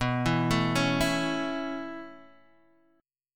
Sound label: B 7th Suspended 2nd Suspended 4th down Guitar Drop D, chord